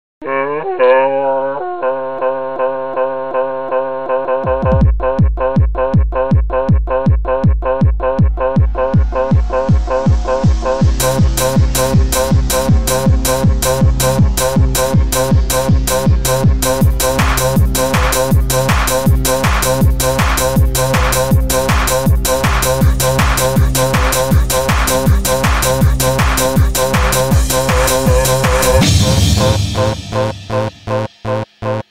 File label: The Most Annoying Sound Ever Techno Remix